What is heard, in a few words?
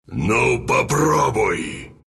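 death long, Russian; Wraith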